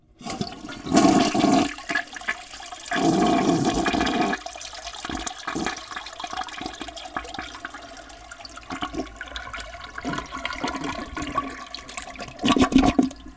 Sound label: toilet flush clear; mike koenig